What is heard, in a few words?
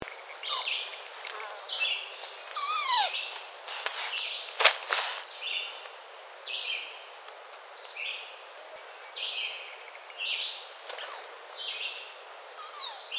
Grouse